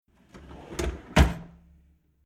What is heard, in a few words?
Household Domestic Kitchen Cutlery Draw Modern Sliding Open Metal Rattle; Drawer; Tom Hutchings